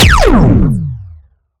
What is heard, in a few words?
Sciweap Shot Large Laser God Ray Shooter